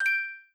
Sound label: mute all; TeamTalk v5.17 ubuntu22 client